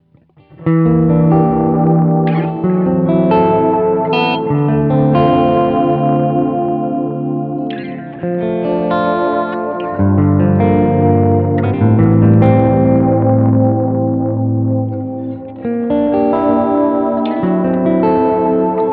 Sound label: light chorus